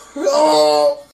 custom growhyo

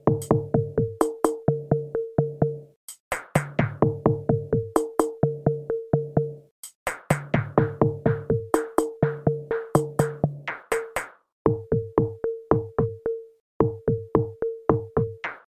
Suspenseful Theme

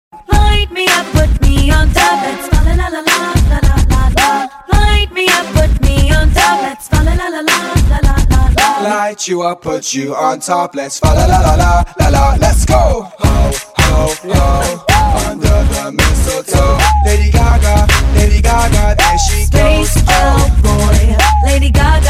x mas lala, ringtone

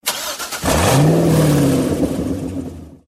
sms engine; ringtones

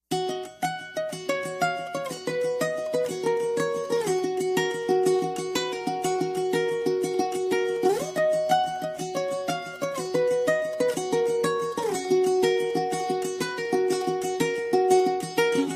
CALM, ringtone

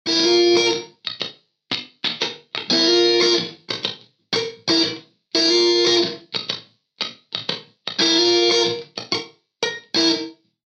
universal tone

